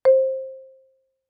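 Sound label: Marimba yarn fortissimo C2; MIS Pitches - 2014; Percussion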